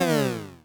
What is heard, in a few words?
Retro Game Weapons Sound Effects